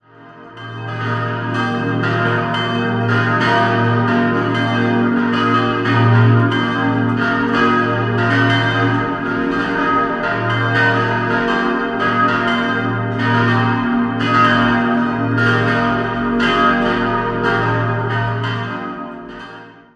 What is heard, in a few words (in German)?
Durach, Pfarrkirche, Glocken; Hl. Geist; Memmingen; 1663; Leohnard und Johann Baptist Ernst und Otto Sartorius